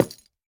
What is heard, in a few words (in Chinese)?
樱花木 悬挂 告示牌 破坏声